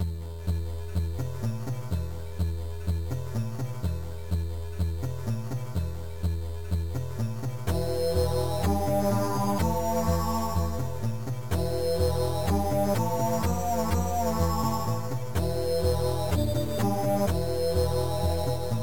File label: Mellow Camel Funk; ambermoon